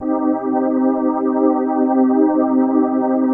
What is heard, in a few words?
PHASE PAD, ATMO PADS; Best Service; XX Large